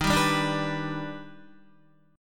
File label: D sharp Augmented Major 7th D sharp Guitar; strummed; 10,10,7,7,7,7; JGuitar